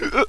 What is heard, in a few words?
hickup; dod